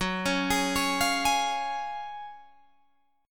F sharp Major 7th Suspended 2nd F sharp, Guitar Drop D; 16,16,18,18,18,16